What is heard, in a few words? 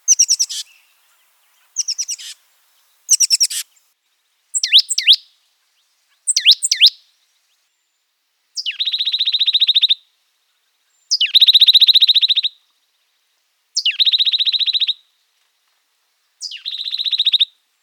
Oak Titmouse